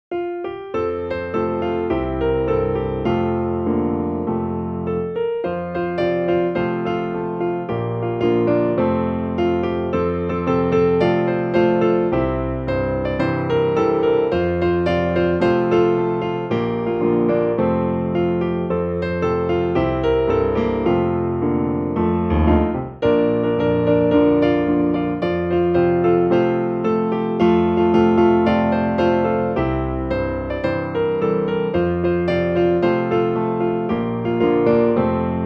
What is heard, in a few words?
Traditional Piano Hyms